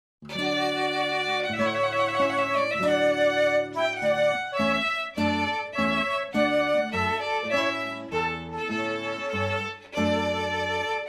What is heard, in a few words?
Trumpet Voluntary